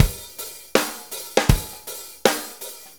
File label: Shuffle Loop, Full Kit Loops; Sonic Foundry (Sony Creative Software); Loops & Samples Drum Tools